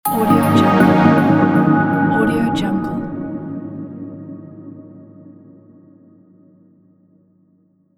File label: soft logo